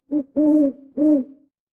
animal owl

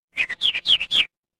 animal bird

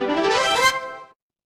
feedback level up

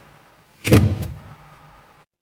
mechanical lever

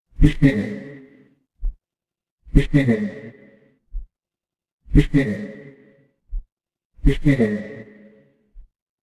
horror heartbeat

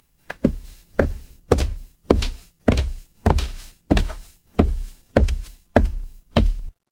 footsteps stairs

